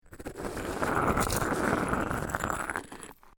Small Stone Grind, A fist sized rock grinding against rock surface; LYN100 package of Stone & Wood sounds; Rock Sound Effects; LynneMusic